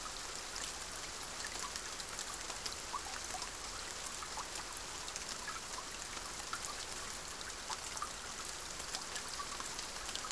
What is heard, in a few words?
Rain builds then subsides drips; boom library; Thunder And Rain